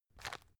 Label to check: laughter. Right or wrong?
Wrong